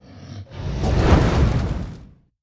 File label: Dragon Roars Breaths